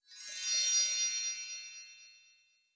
Chime; blue archive

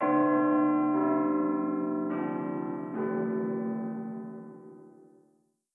School Bell; blue archive

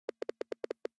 Typing; blue archive

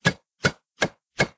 Cook; blue archive

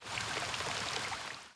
Rain; blue archive

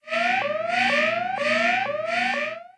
Alarm; blue archive

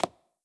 Foot Step; blue archive

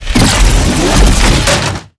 Drone Vulcan Death; blue archive

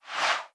BG Scroll; blue archive

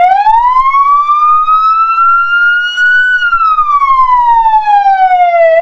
wail, sirens

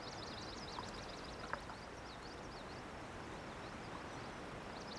UI FX BG Stream; blue archive

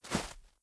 Snow Foot Step; blue archive

